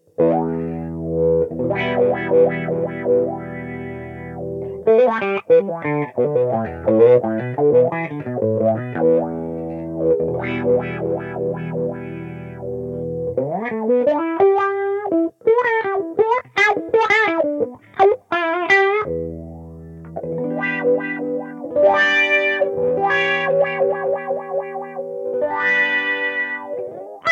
crybaby

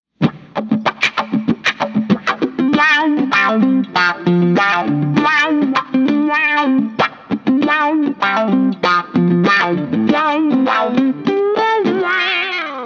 Cry Voodoo, wah

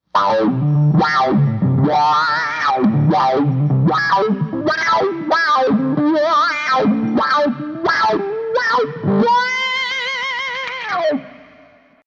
Mega Mega Wail

